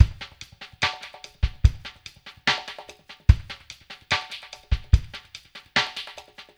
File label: BOLERINO, FUNKY; Spectrasonics; Liquid Grooves 1 & 2